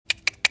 tongue clicking

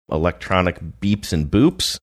electric beeps and boops

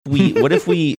cute giggle

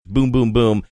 boom boom boom

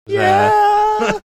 singing yeah

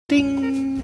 quiet ding

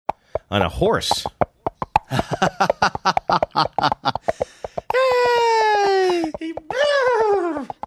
clip clopping making excited sound neighing

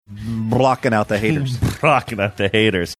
mouth burbling; blocking out haters

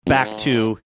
blowing a raspberry

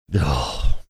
ugh

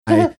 cute laugh

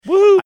woohoo

